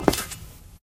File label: black tile, footsteps